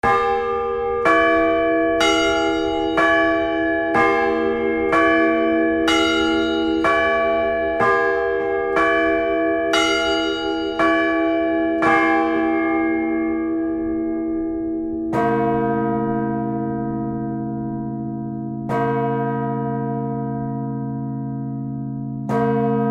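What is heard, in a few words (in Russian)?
Колокольные звоны, Большой (валовой) благовест; Колокольные звоны Татарстанской митрополии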